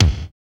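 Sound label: bass drum, bass drum dance; Sound & Vision - Gigapack I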